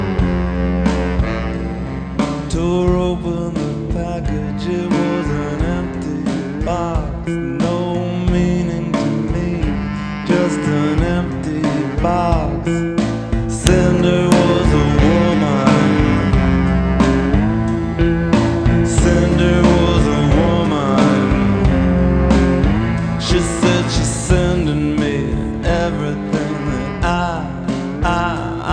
rock

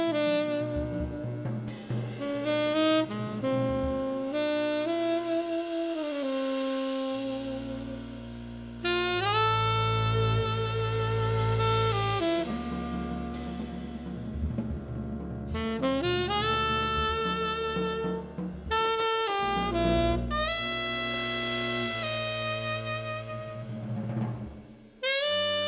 jazz

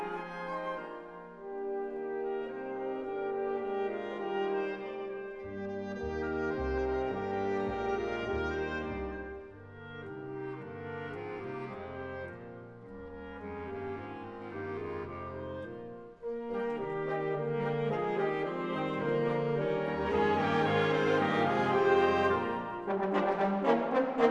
classical

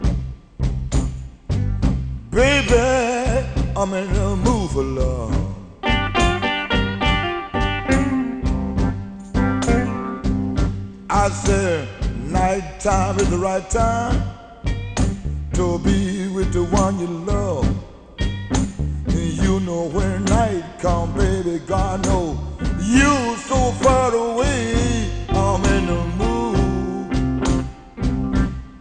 blues

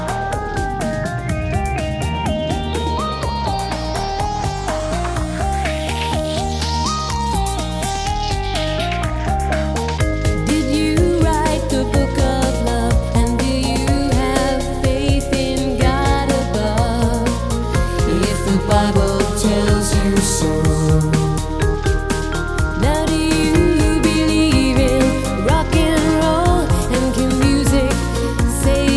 pop

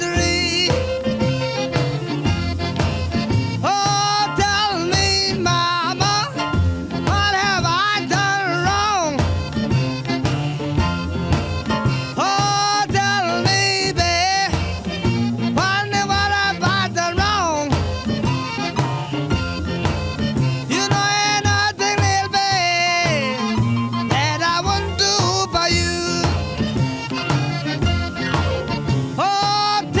blues

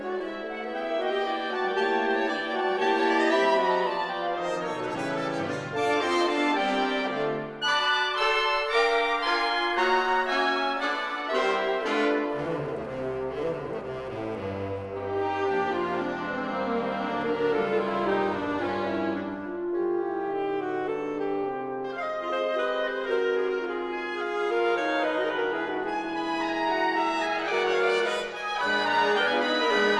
classical

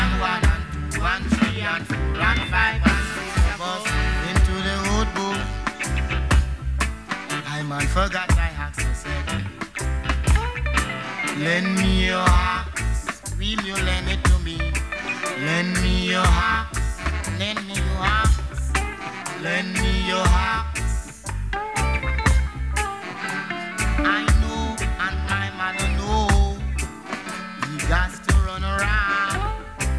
reggae

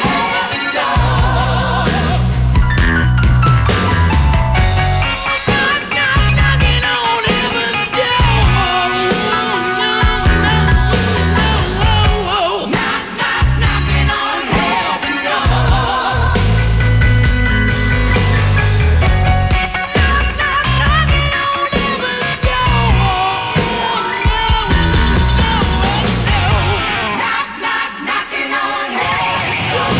rock

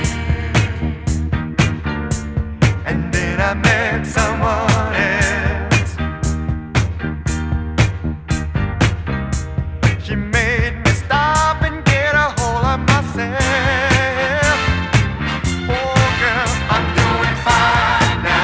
disco

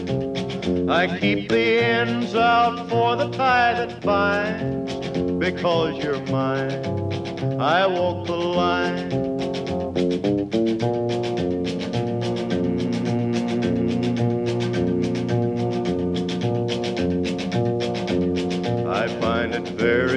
country

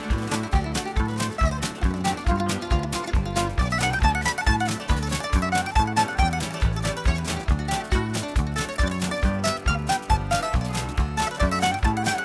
country

